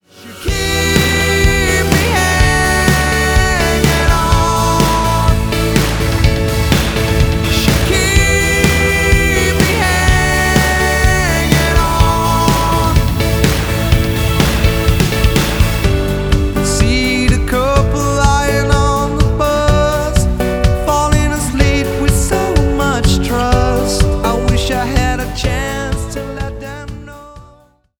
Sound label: ringtone